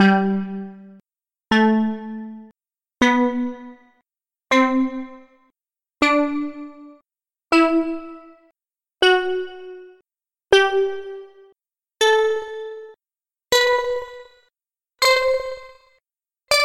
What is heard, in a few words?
combo Tones